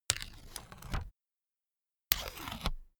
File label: notepad sheet tear off